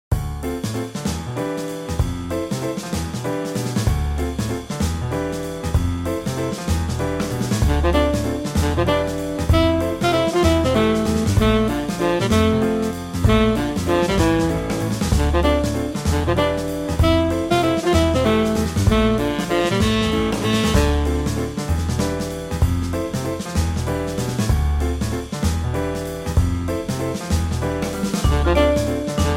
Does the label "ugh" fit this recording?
No